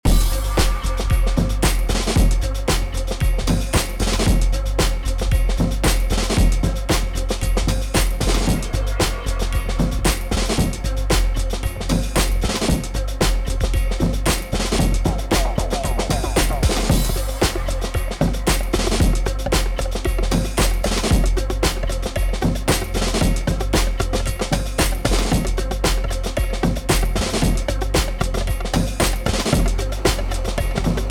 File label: Good EQ Reverb